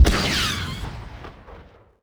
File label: rocket ignition